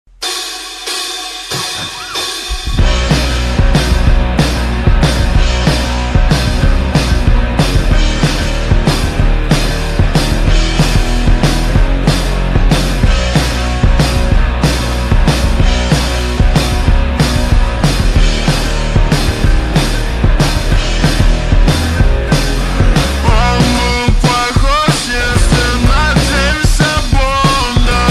slowed low reverb

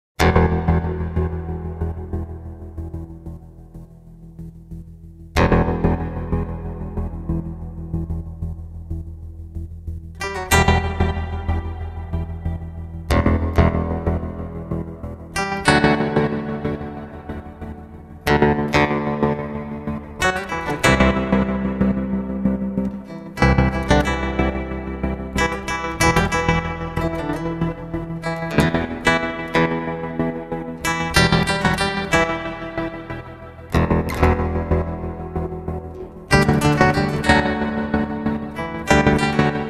meditative guitar improvisation

